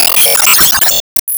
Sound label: Enemy Fashion Damage Hit; disney star smash android ios mobile gamerip 2020